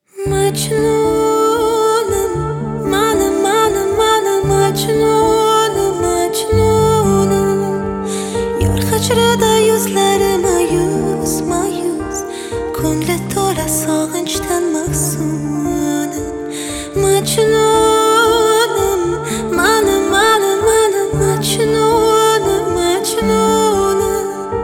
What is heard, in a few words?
piano version